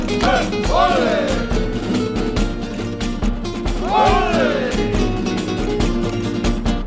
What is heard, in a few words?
music world music shooter swarm